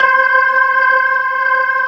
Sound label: C5, Keyboards Old School; USB Soundscan vol.09; AKAI